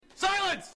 Silence

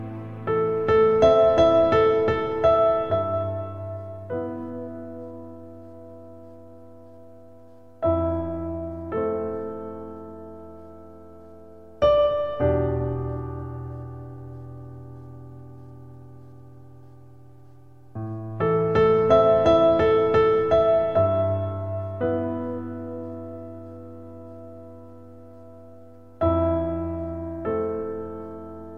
Very Sad Piano Music

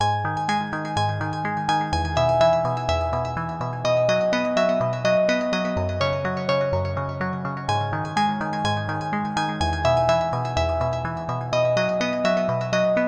Acoustic

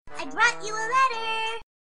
Cutest Sms Tone

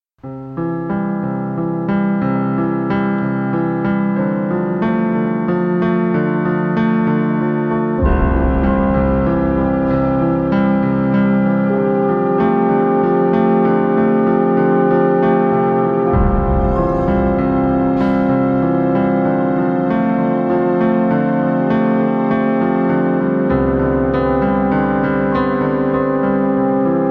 Piano

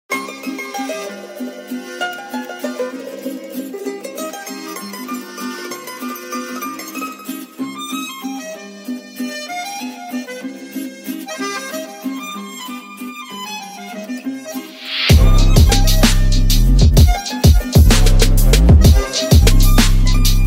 Trap Remix

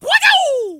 Chicken Wing

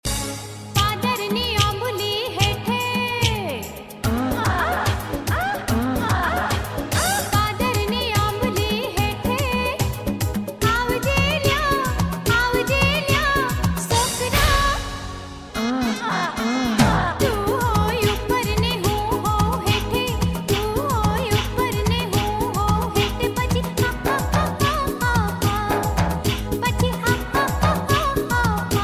Gujarati Jalsa

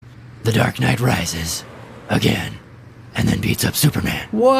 Beat up

